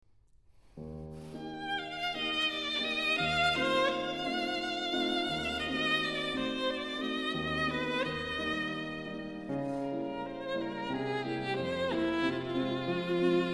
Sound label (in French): melodie